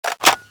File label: spas12ex change